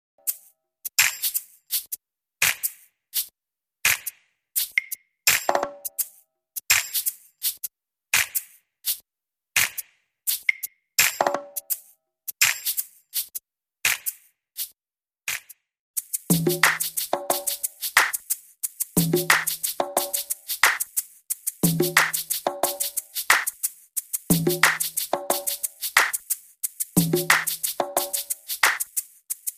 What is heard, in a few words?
Click Tracks for Gospel